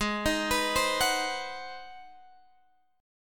Ab 7th Sharp 9th Guitar Open G, chord

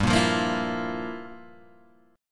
G Minor 13th G down Guitar Open G

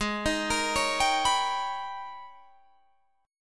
G sharp Minor Major 11th, Guitar Drop D; 18,18,20,18,20,19

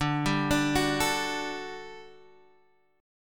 G Major 7th Suspended 2nd D

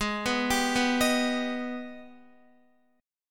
G sharp Minor Major 7th G sharp down Guitar; G#mM7 arpeggiated; JGuitar

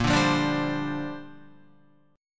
Bb 6th Add 9th Bb Guitar; Bb6add9 strummed

